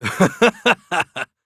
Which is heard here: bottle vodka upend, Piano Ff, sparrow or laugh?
laugh